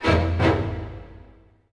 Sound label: Orchestral Loops STRING ACCENT